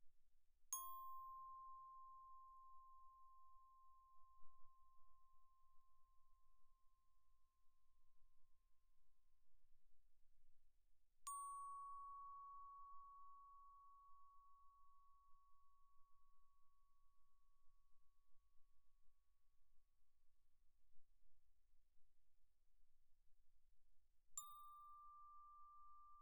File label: bells, brass, C6 B6; Percussion